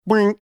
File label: making funny sound